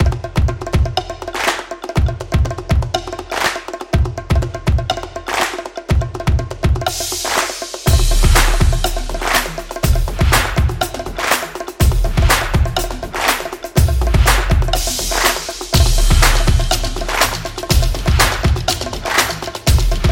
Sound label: Tribal Drum; monster attack